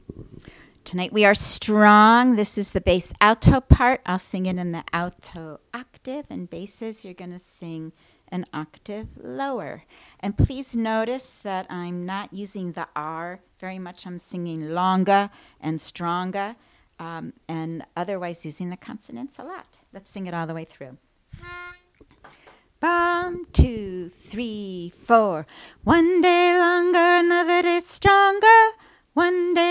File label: bass alto